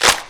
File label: boil hatch